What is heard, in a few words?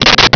softswitch